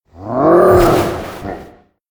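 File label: entiwood spawn; monster; Senillinea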